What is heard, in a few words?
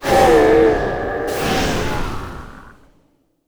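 soul seeker death, monster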